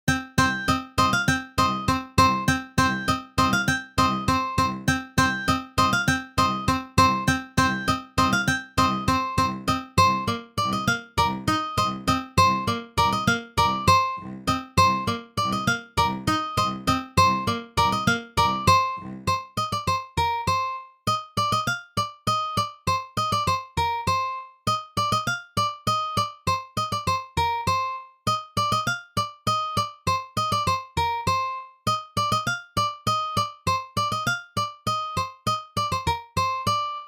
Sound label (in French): deux guitares et cello; endro; hanter dro